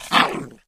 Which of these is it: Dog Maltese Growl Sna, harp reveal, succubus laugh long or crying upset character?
Dog Maltese Growl Sna